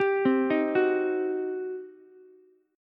G Major 7th Suspended 4th Sharp 5th, G, down, Ukulele; GM7sus4#5 arpeggiated